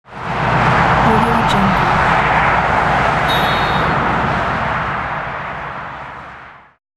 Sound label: speedway traffic car truck honk blast